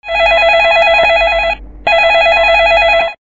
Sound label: MIDI; NGM